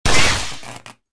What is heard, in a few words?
pain; Star Wars Jedi Knight II Jedi Outcast; XBOX